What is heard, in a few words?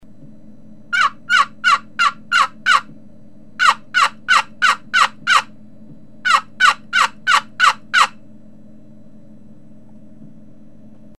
Sassafras Call; Thompson